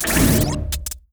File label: Armed Ghost damage